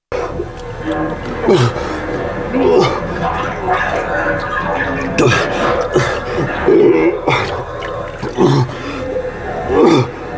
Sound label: breathing water